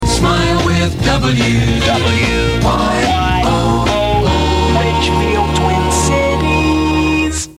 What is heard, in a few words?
Jingle; WYOO AM